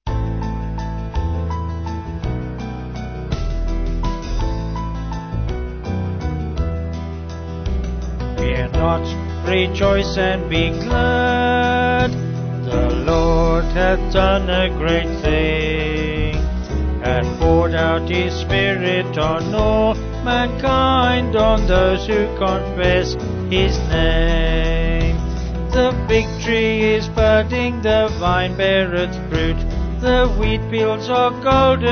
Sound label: Vocals Band